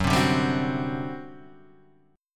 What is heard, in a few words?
G Major 7th Suspended 4th F sharp